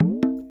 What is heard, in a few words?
tape fx, scratch; Ambient Grooves